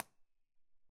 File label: Domestic Living Room